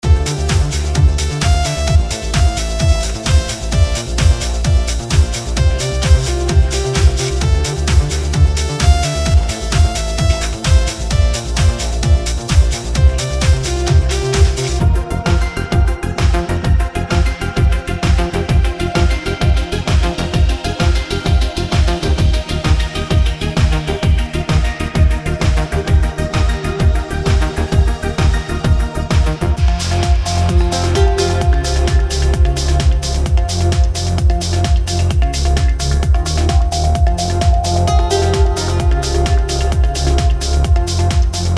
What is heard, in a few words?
Trance